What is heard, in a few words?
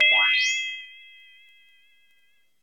artifact